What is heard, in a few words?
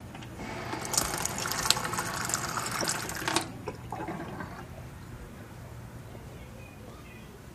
Water Fountain Hospital Corridor, Run, Drain